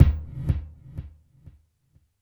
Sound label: Gated Kick, Gated Reverb; Univerb; musicradar